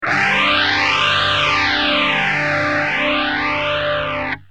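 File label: guitar, phase 100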